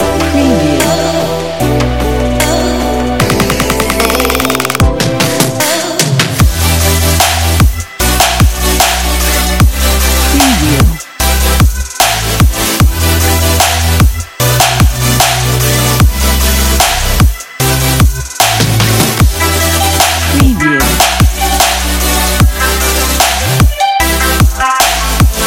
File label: future bass action sport